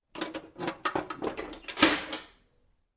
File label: Metal compartment rustling